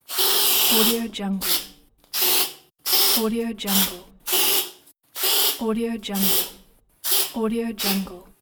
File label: deodorant spray